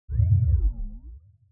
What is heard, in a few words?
move cursor; PremiumBeat SFX